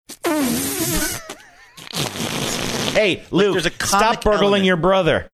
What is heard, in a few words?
making mouth farting sounds saying hey stop burgling your brother